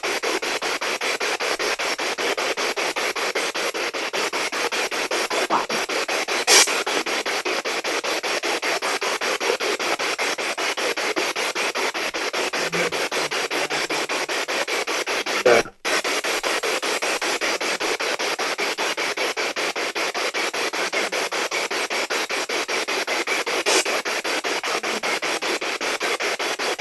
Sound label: Tattoo Shop Spirit Box